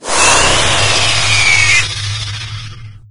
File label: imp death; doom3